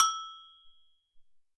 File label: Agogo Hi, Alesis SR-18